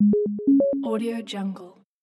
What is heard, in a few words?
notification